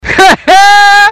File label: heheee